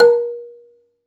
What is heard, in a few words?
Rubbery Piano, prepared piano; Total Piano